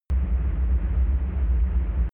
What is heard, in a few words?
drone spaceship large rumble